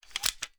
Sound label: rem870 insert